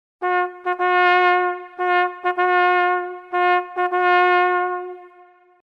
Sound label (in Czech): postupovat rychleji, troubeni